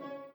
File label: b piano